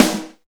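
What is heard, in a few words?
hard rock snare, drums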